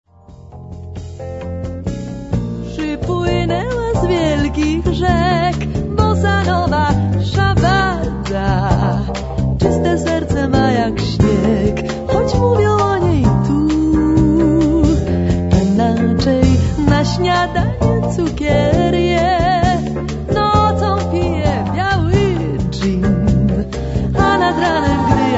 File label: bossa nova